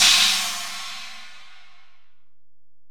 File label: Ride, Alesis DM-PRO, Drum Machines